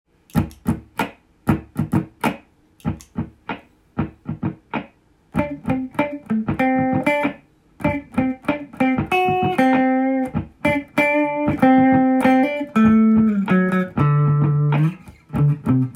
d7 chord tone, guitar

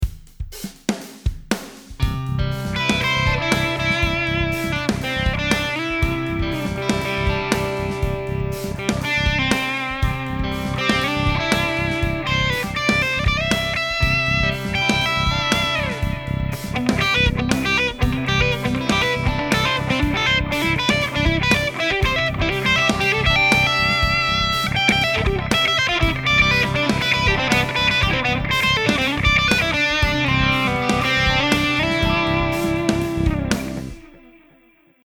3 string guitar solo

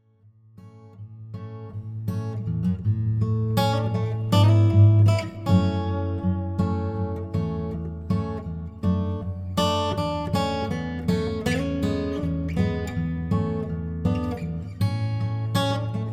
tears guitar

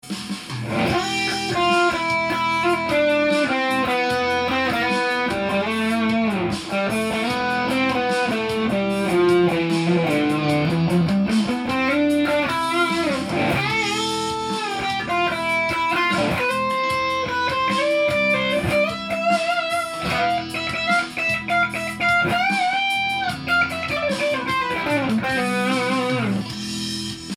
e phrygian, guitar

